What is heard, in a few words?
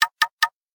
Knock, notification; Optimus Vu F100S; LG